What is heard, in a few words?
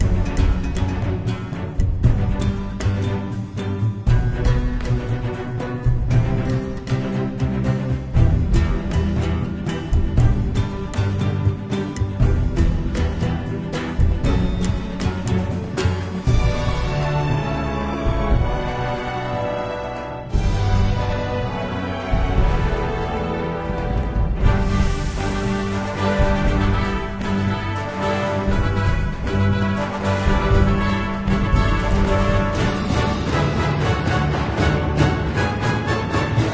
Battle Map Music; fantasy forest story